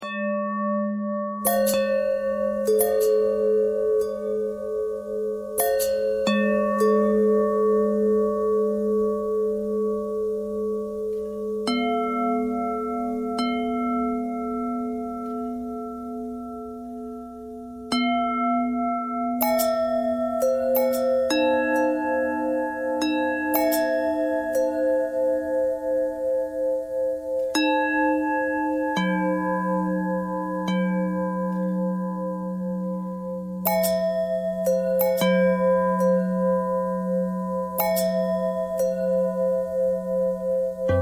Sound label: long bell bell thread pentatonic, bells